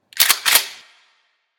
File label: Rem 870 Pump